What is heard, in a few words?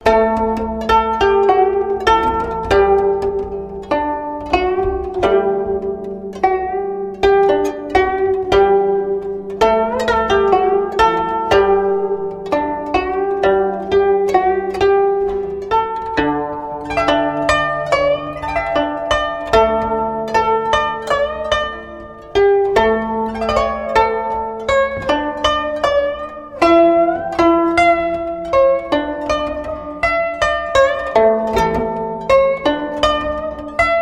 Music; wu ling chun